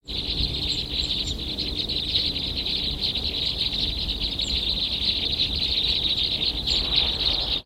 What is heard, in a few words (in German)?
fichtenkreuzschnabel